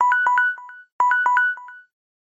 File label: tick tac cute short sms tone ringtone